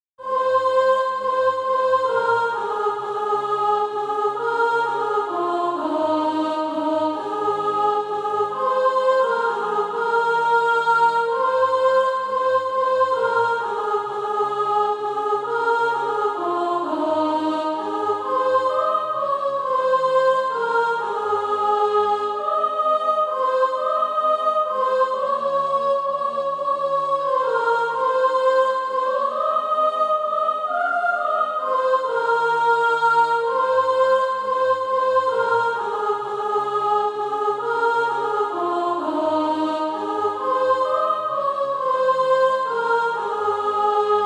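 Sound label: soprano; Far and Near Clemm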